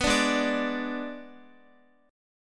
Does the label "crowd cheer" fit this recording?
No